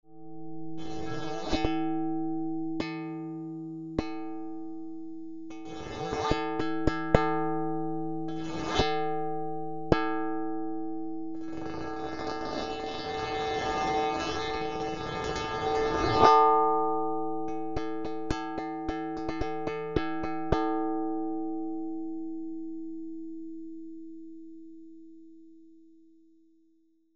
Mogees

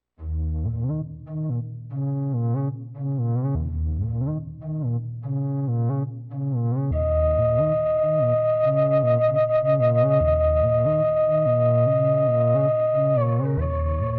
EXPRESSIVE E OSMOSE bansuri

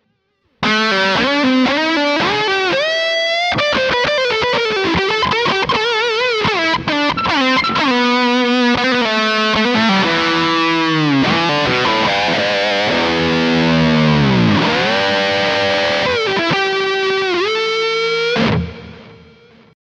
Schecter Bridge HB Lead; Nick Johnston

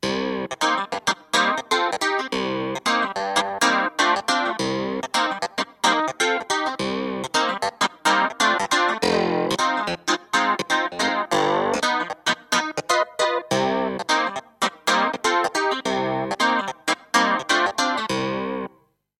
Chorus Clavinet

